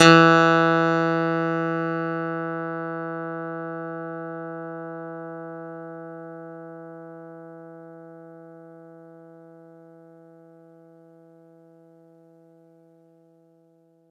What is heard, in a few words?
Clavi, Digital Keys; Nucleus Soundlab; MK Digital Keys; MKClavi S